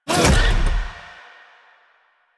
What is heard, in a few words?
Bullet Jump Land, Motorcycle; Motorcycle Ninety Nine